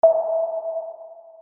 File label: blip collected